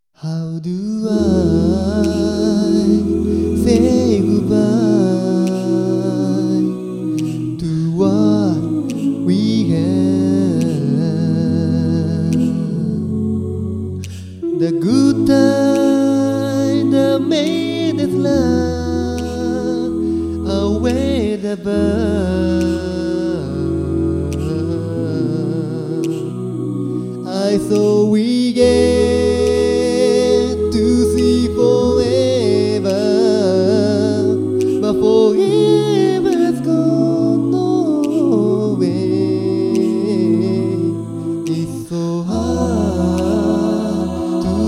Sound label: good bye Vocal